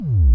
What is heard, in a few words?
obnoxious kick